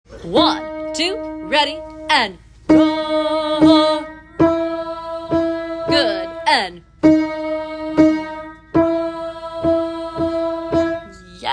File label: Alto, vocal tracks; Mean Girls